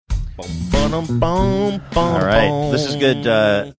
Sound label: singing along funky bass